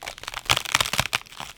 Branch Breaking, cartoon Foley Fx; musicradar; cartoon caper

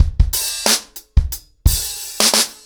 90BPM, ACOUSTIC DRUMS

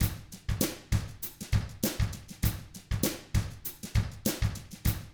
FUNKY, Drums

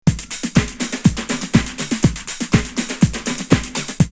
Hause 122, drumloops Techno House; X-Static Goldmine

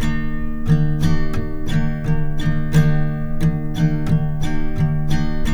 Guitars; Spectrasonics; Hans Zimmer Guitars VOL-1

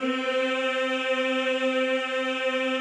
CHO, Roland; Sound & Vision - Gigapack I CD 2 (Roland); Mix-Combi 2

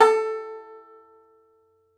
TENR BANJ, Tenor Banjo; Plucked Strings; E-MU Producer Series Vol. 7 – Old World Instruments

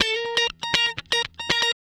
Guitar Licks; Best Service; ProSamples vol.17; AKAI

